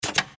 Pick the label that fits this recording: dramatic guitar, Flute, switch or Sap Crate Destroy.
switch